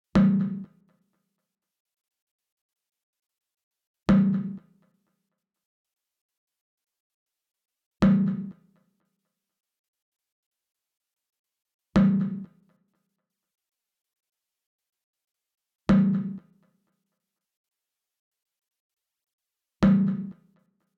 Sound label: Perc G m 122